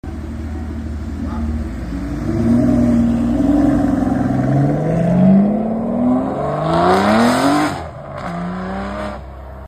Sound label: Aston Martin, اگزوز